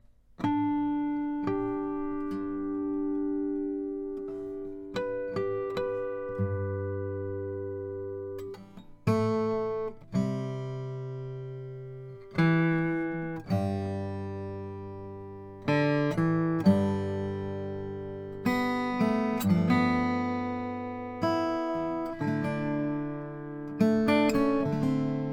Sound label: rasmussen c brazilian rosewood